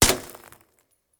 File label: Bullet Ricochet; Sound Ideas; Guns